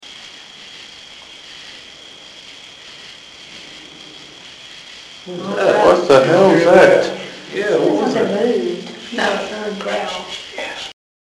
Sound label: basement moan